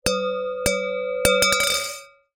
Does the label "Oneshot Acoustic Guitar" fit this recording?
No